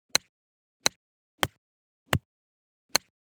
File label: golf club approach wedge stereo; soundscrate